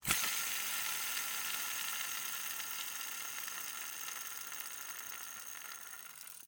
Rollerskates, old fashioned, all metal, wooden wheels, swipe, spinning, slow to stop, abrupt; Eiravaein Works; Vaeyan IV